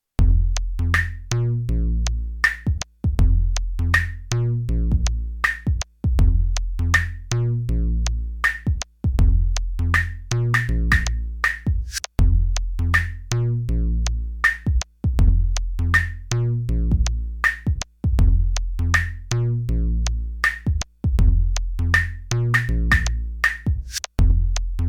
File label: PO Bassline